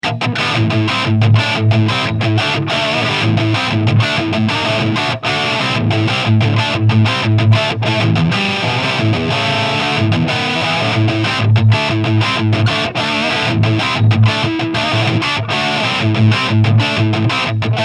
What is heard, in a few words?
Heavy Crunch Regular Guitar